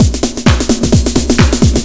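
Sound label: drum loop 130, left; House; Best Service ProSamples vol.10 - House; AKAI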